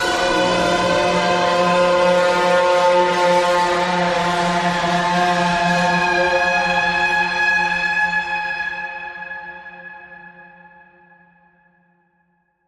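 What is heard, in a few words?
creature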